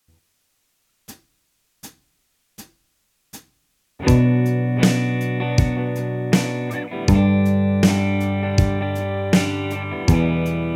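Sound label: fretboard chart 80bpm with bass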